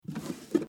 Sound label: drawer